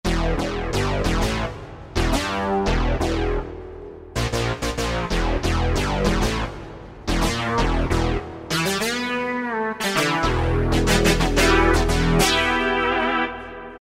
Attitude, Alesis Z5 Vintage Synthesizers; Vintage Synthesizers And Classic Beatboxes

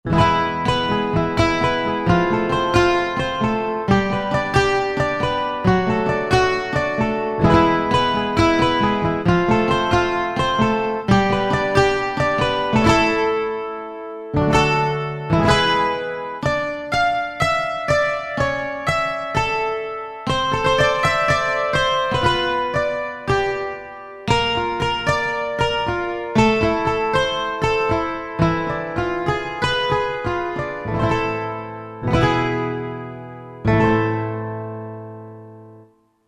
12 Strings; Country; Roland SR-JV80-17 Country Collection

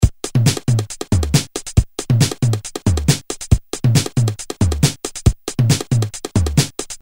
Electro, Korg DDM-110

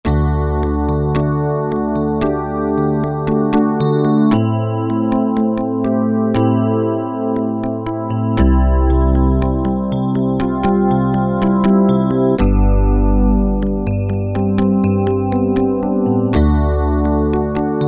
Digital Rhodes, Roland JD-990; Factory Patches

